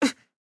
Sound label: AHurt; Garnet